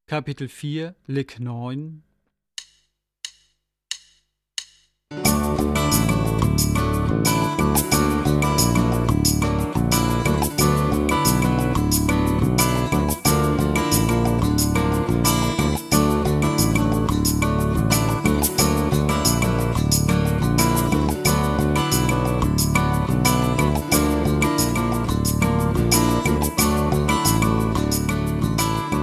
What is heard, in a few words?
Latin Style Guitar